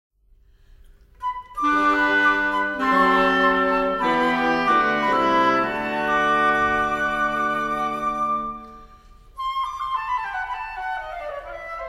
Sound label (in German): Holz; Vogelhochzeit